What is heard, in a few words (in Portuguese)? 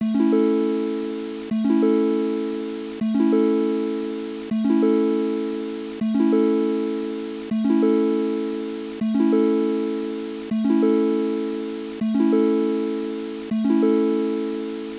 triangular Loop